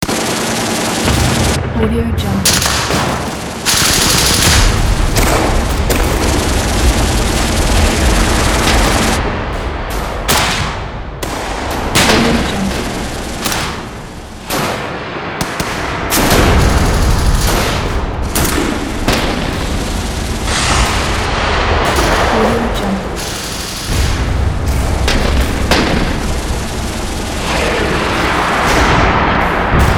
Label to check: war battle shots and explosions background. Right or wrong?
Right